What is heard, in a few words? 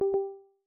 Device Connection Error; Microsoft; Windows 10x